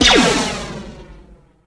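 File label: weapon blaster fire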